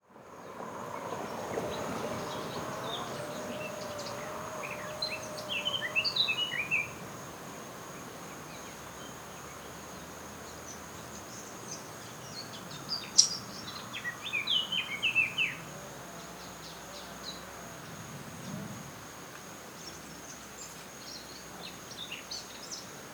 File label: Sylvia atricapilla; SETE